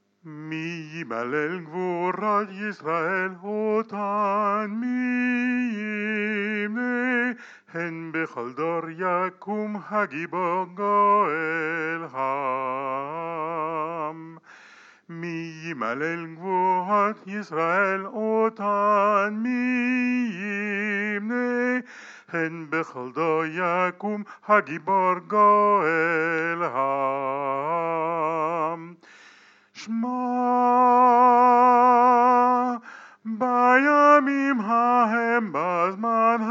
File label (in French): Mi YMalel soprano chante